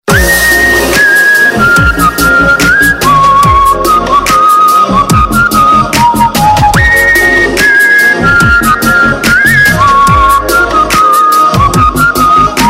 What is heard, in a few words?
whistle ringtone